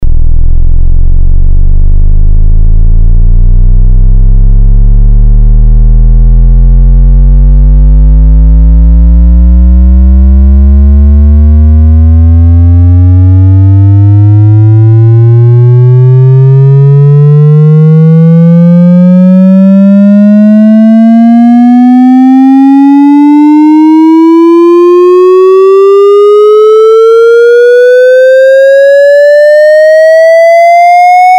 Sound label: sweep